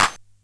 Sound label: Bent Clap; glitch; Bent Hits